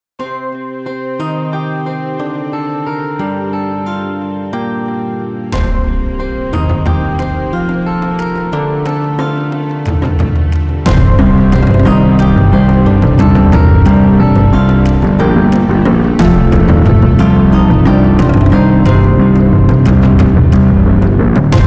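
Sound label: zither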